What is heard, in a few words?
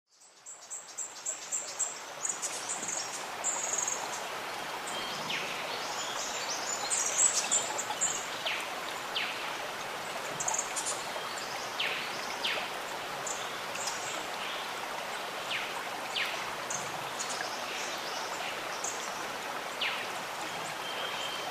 streams waterfalls; andrew skeoch